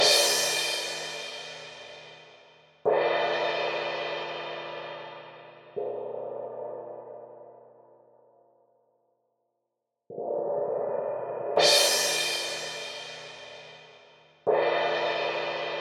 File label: cymbals